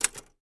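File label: Typewriter Single Key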